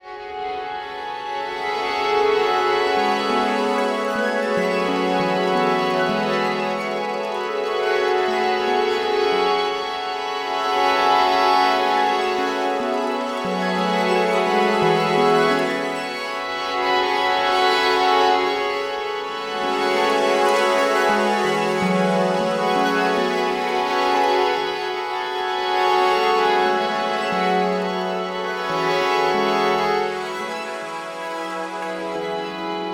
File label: strings layered